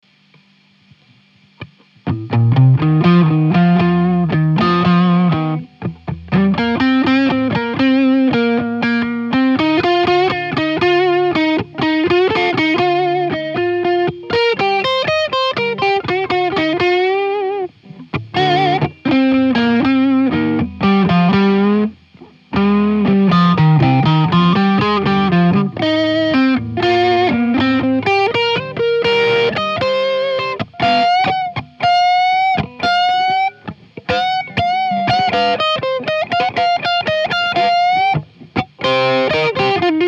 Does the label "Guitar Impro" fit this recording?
Yes